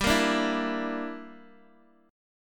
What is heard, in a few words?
G Minor 6th, Guitar Open D